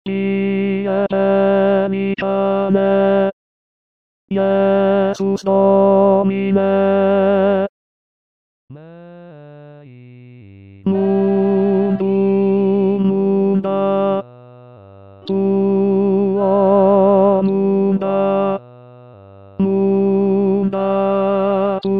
tenor; pie pelicane

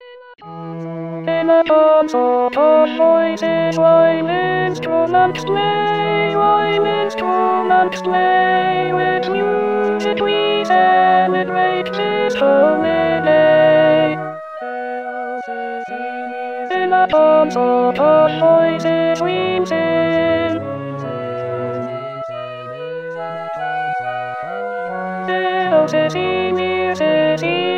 alto; baroque

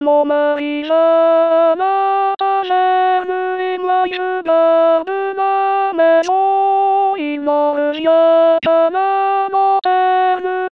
alto; rimailleurs

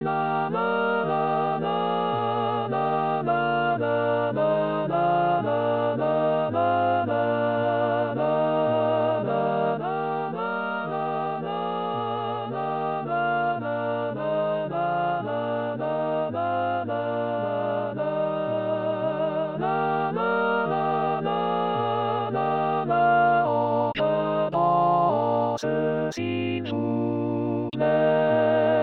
alto; bourgeois gentilhom

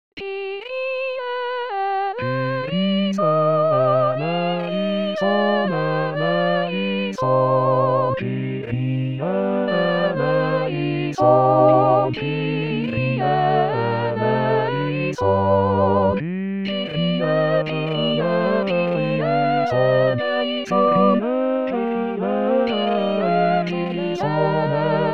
kyrie soprano; 2023 st jean

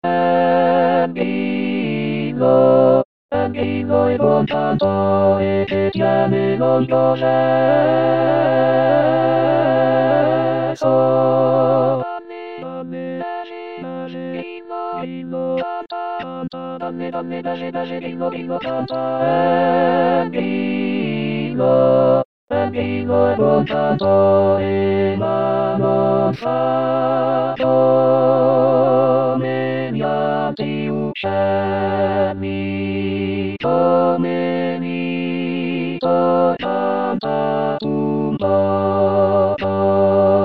tutti

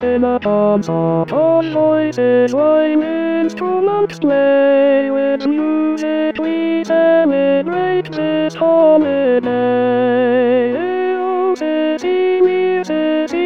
tenor; baroque

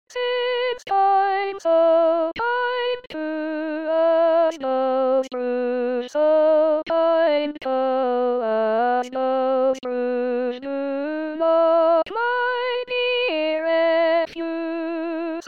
catch chr canon; 2020 baroque

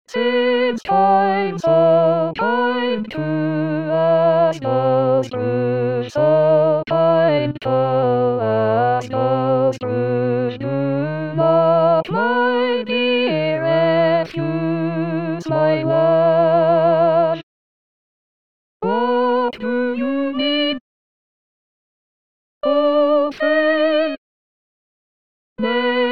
solo; catch; 2020 baroque

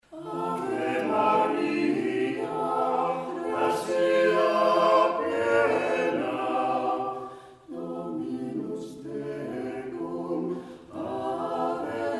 ave maria arcadelt; 2013 mille choeurs

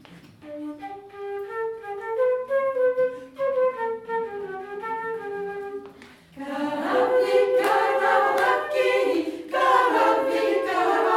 karavaki; 2012 st jean

